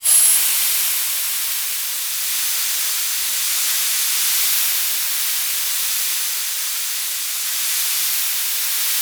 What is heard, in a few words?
Aircrafts Spitfire LF Mk IX Valve Drain Hiss; DCS